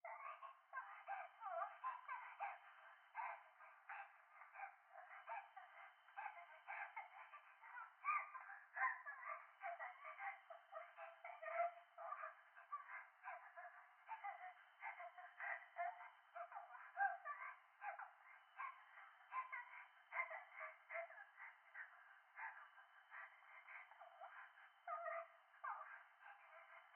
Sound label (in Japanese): 風呂 可愛い喘ぎ 山奥, ASMR